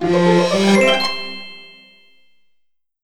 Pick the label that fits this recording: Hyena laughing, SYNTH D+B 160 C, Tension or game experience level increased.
game experience level increased